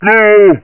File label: pain; svencoop; uboa; ichyhakase